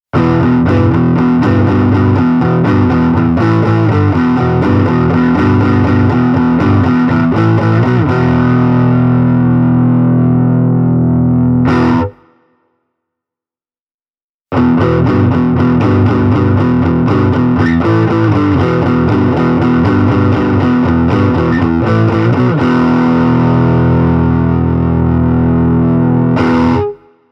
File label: Schecter Stealth C 1 distorted